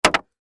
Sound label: Lever Wood Large, Foley Lever Wood Large Impact; Materials; Blastwave